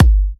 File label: G, kicks; markus hakala kicks